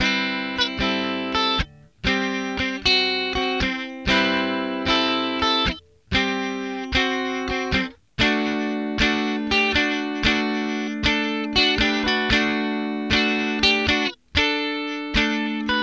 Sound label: 3 Guitar Layers